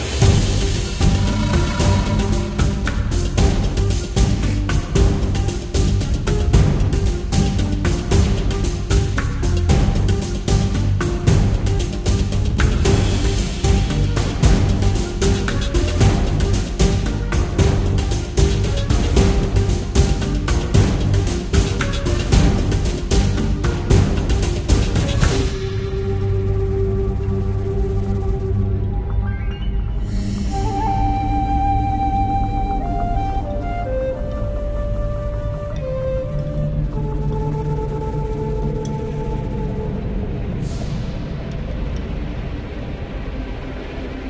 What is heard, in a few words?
music; Beano - Bush Chucker Challenge